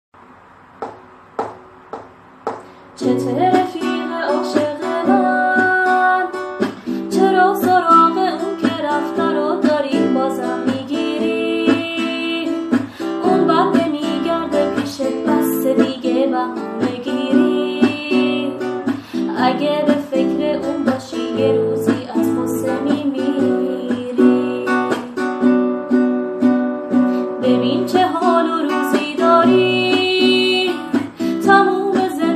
ghamgintarin ahange donya

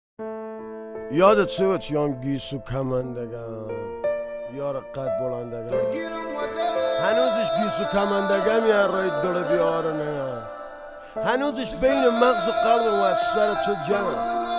kordi ghamgin remix

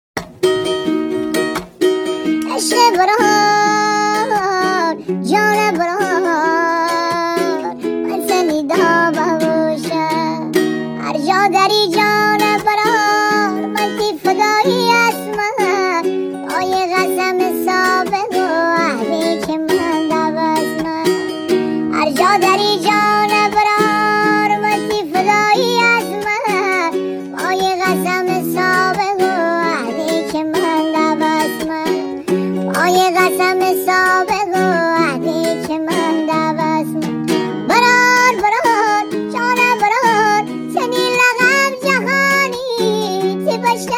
mazandarani shoti lati

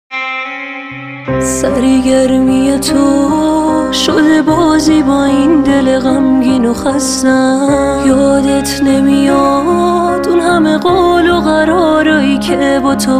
ghagintarin ahange donya

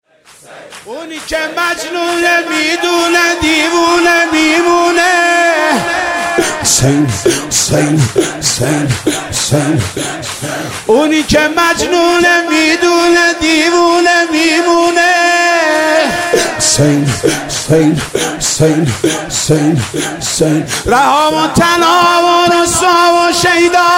nohe ramazan

